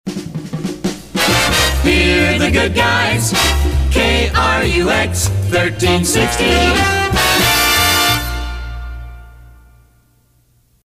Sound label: City Sig; PAMS; KRUX